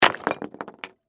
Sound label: Crash, Rock, Debris, Small, Dump; Materials; Rocks; BlastWave